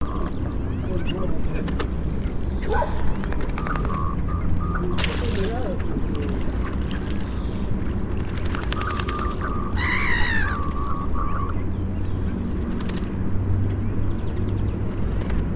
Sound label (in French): Harle bievre Mergus merganser; Natura 2000